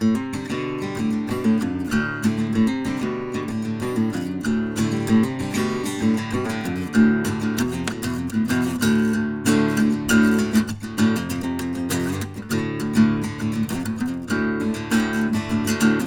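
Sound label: Acoustic Guitar, KM84 Clone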